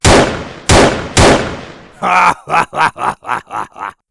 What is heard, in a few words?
Gunshots and Laugh